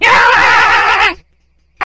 kamikadze walk

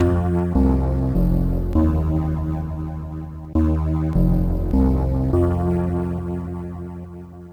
Death; Shadow of the Beast; Amiga

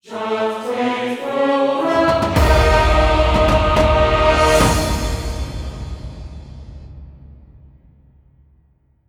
Fanfare - Level Up!; Alliance Formed (Closed Alpha)

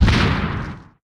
Flame Explosion; Smashy Road Arena android ios 2016

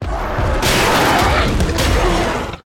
Gate smash; silent hill homecoming 2008 gamerip pc ps3 x360

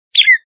Birdy; Super Mario 63

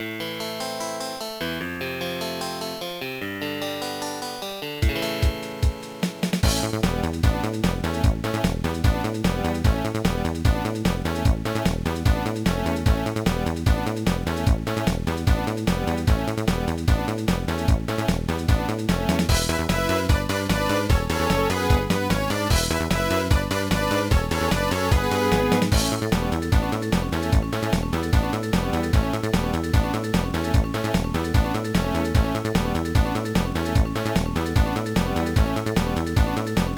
BGM; inspector gadget racing; gba

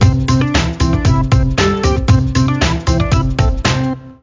Jingle LEVEL COMPLETE; Frogger Helmet Chaos; nintendo ds

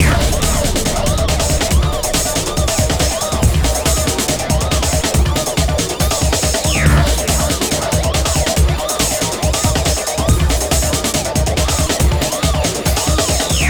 High Groove 2 Frantic; Unreal II The Awakening; Acheron

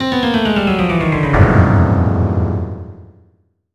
Goal; Motto! Stitch! DS Rhythm de Rakugaki Daisakusen; NDS

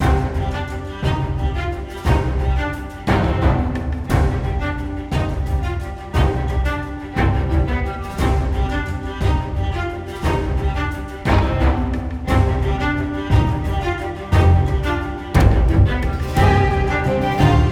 String; Assassin's Creed Unity Dead Kings

